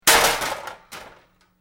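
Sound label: Metal Medium Impact Settle, Noisy metal junk impact, fall and settle; Tools or metal parts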